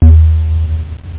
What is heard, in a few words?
stick